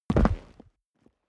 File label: step boots concrete jump; schritte